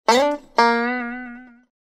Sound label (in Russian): vnezapnaja dogadka